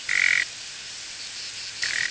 call, Nightingale, warning / threat call often uttered near the nest; ALTITUDE: +130 m.; luscinia megarhynchos